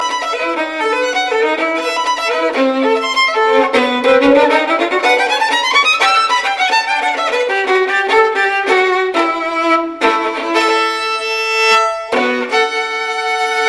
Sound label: Violin Song